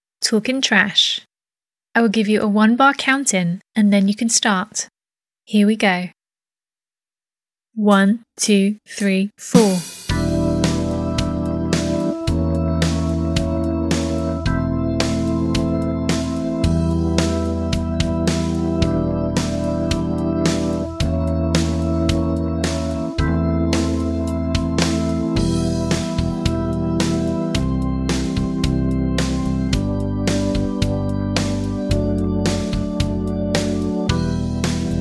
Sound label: Guitar Talkin Trash; Orange; Exam